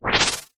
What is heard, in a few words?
spin